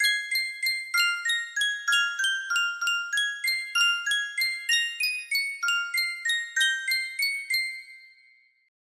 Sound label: Sankyo miniature boite a musique, music box melody